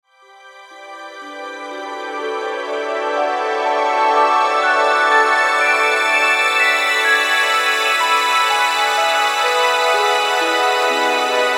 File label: Bed Twinkle Arpeggio String Swell; Bed Sound Effects; Blastwave; Production Element Imaging Element Accent Transition